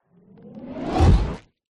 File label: fantasy magic spell zap whoosh cold ice crack impact